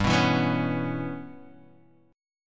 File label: G 6th Flat 5th, Guitar, Open F; G6b5 strummed; JGuitar